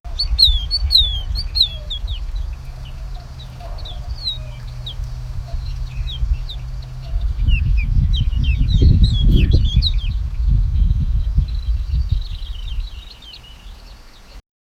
sunny day on top of, hill

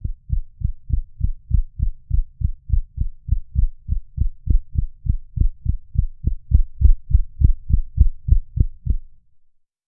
the growl fades into a, heartbeat

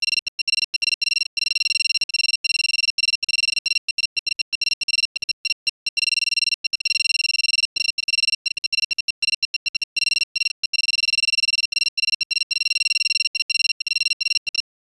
sci-fi computer, computers

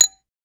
sound of a bounced glass

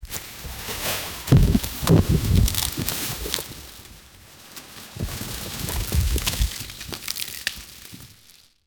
the same boy suddenly sli

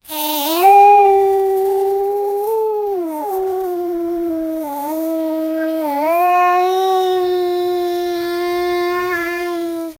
cute baby elephant sound, elephant trumpet

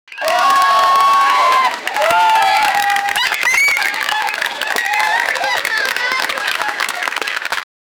crowd cheering club ambie

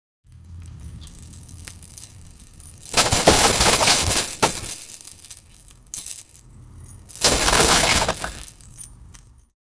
the loud sound of breakin

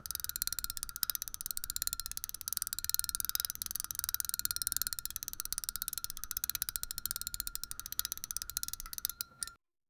non musical mechanical, toy

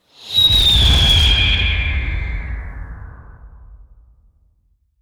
a sound which will be, camera

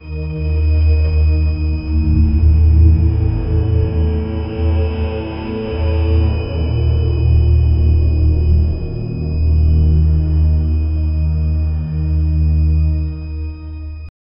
dark classical evoking sp

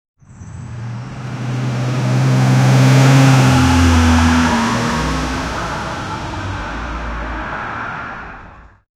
a small car arrives and, collisions

